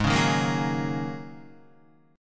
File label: G sharp 7th Suspended 2nd Suspended 4th, Guitar; strummed; 4F,4A,5C,5G,5C,6E; G#7sus2sus4; 3,4,3,3,6,4